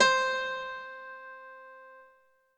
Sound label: korg m3r grand piano c5